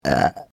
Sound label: burp big